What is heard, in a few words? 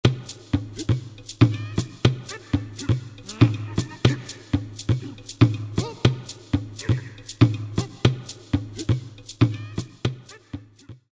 Tribalistic